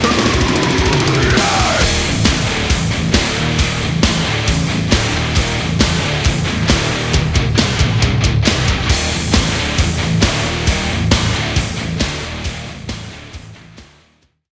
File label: mus playerkill; Smite